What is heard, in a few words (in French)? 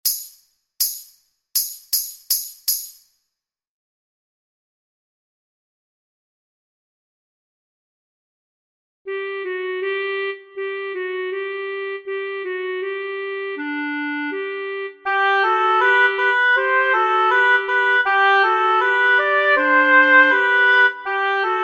lent sans claves; Gavot